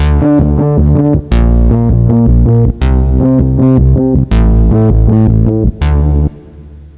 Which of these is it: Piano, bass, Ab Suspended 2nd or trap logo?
bass